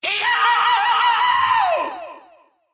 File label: Scream Italian Ringtone; Pizza Tower